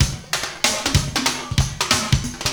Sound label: PERCS, 70 s Breakbeats